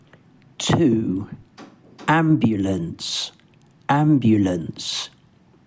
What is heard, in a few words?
ambulance; transport သယ်ယူပို့ဆောင်ရေး